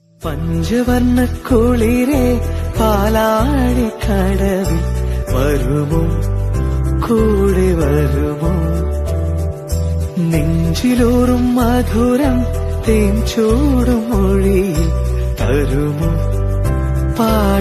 New Romantic Malayalam Song Ringtone; ringtones

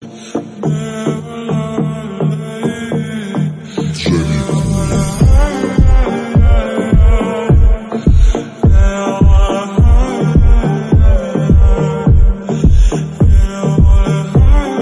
Mood Off Feeling Bgm Ringtone

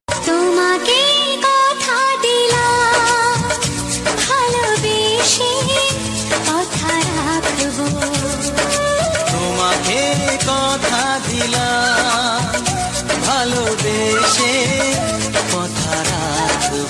Bengali Song

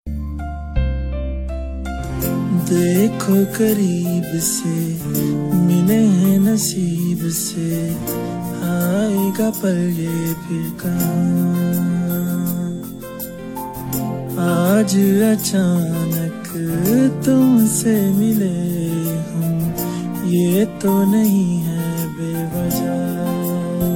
Romantic Hindi Song

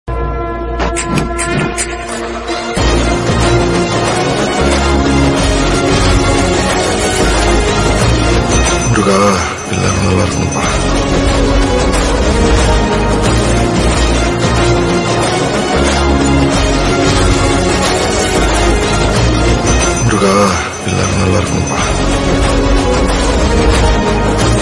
BGM Ringtone

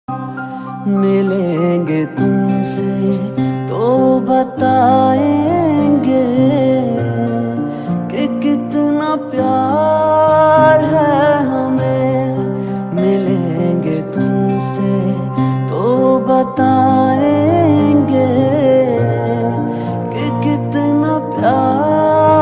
Old Hindi Song Ringtone

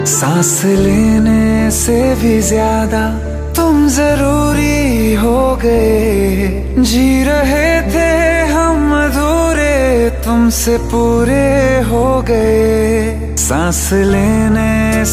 New Hindi Love Song Ringtone

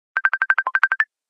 Facebook Messenger Call Ringtone

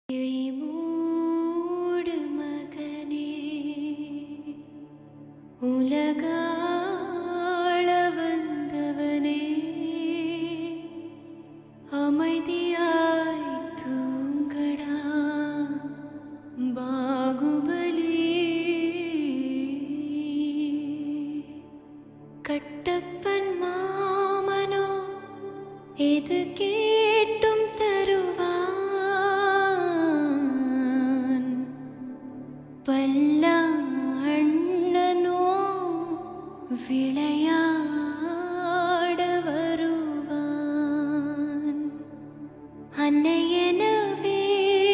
Tamil Sad; Bahubali 2

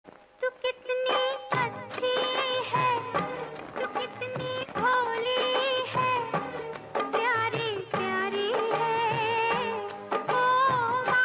Bollywood Song

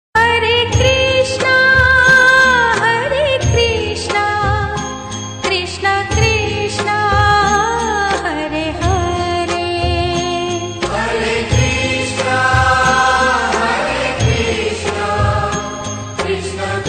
Hare Krishna Female Voice Ringtone; ringtones

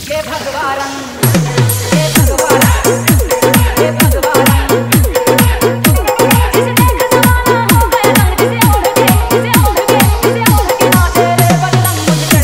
Song Dj Mix Bhajan Ringtone

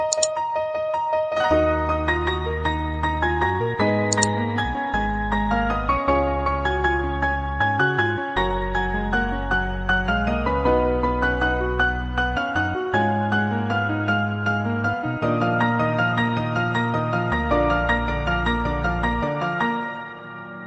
Instrumental Version Ringtone

